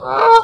goose honk; Animals

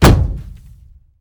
metal thud

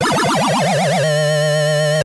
sting, Arcade

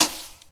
syringe gun, Gunshots; Weapons

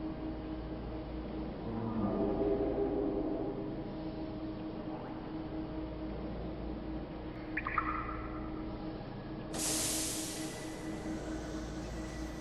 ambience

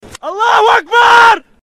charge, Voices Emotes

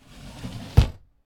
shoji door close; Vehicles

